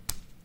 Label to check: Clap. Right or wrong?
Right